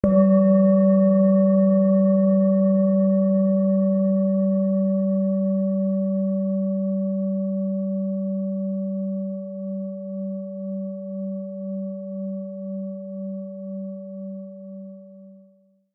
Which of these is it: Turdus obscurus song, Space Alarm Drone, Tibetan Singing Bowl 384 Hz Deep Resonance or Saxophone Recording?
Tibetan Singing Bowl 384 Hz Deep Resonance